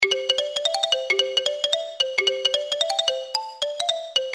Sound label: jack in the box; mvm forever